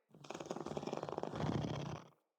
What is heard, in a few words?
Purr; Catbrow; Pet Ship